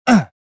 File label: land, prisoner